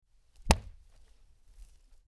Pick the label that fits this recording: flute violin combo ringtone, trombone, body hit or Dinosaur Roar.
body hit